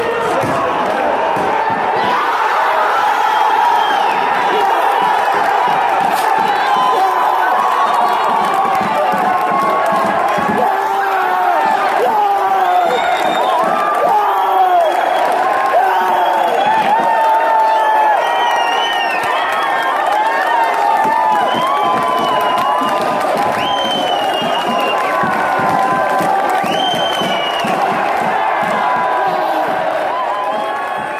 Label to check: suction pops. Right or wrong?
Wrong